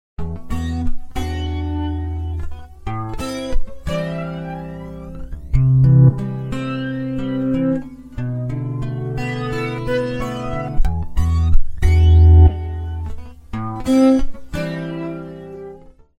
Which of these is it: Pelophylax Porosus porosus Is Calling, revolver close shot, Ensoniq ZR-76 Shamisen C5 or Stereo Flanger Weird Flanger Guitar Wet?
Stereo Flanger Weird Flanger Guitar Wet